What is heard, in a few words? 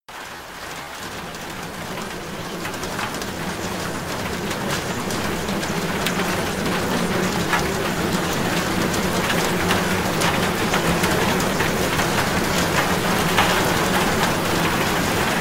Rain Sounds; Frequency-free